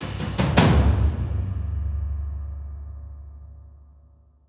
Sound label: Mission Completed; Cross of the Dutchman